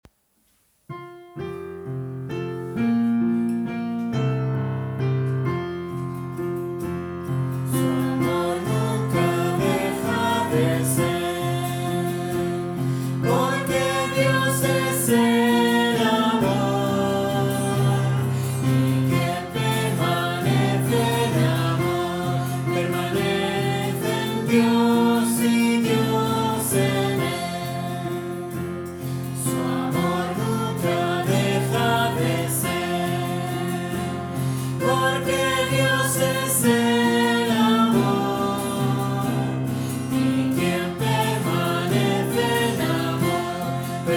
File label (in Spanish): piano y guitarra